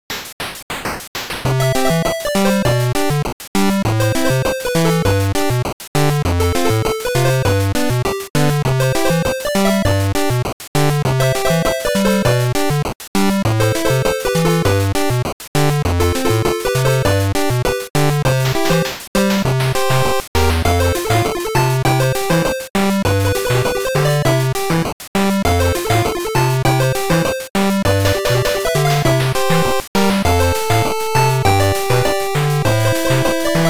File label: 8bit Tropical Festival